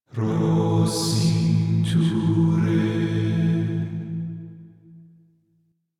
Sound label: Rand Chant A; Warframe; DE; Hubs; Syndicate Arbiters; Tenno